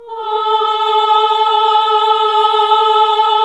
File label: HAL AAH, Nuns Aahhh